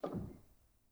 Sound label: rel, Grand